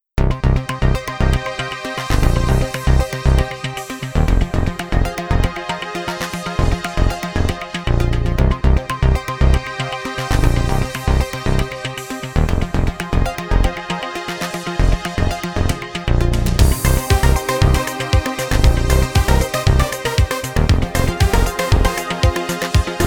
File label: oldskool vibes